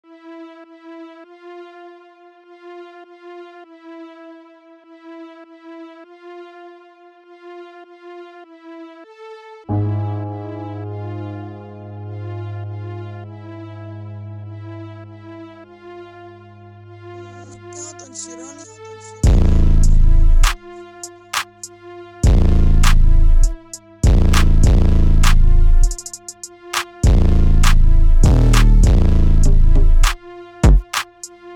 ORCHESTER 100BPM

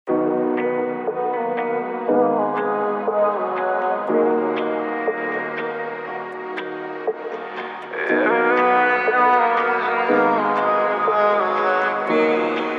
37-41hz Low Bass

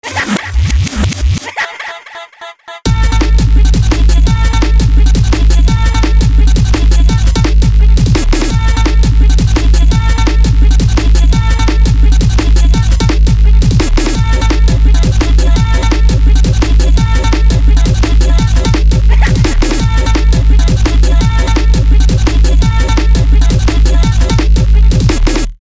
Beat Drum Bass Version

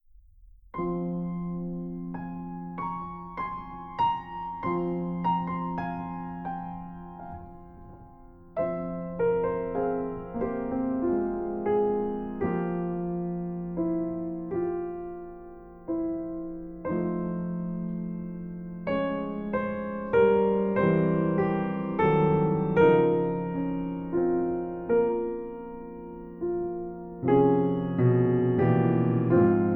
preludes F minor; Five preludes

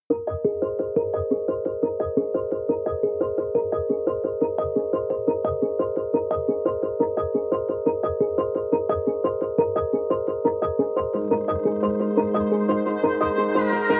Glockenspiel Riddim

